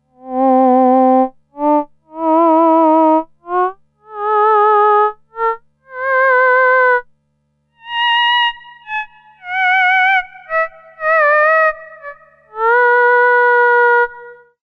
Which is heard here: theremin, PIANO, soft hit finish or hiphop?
theremin